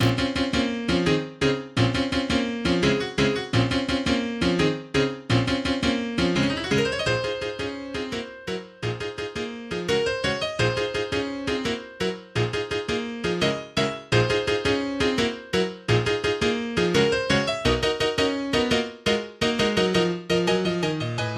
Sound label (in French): exercise pour piano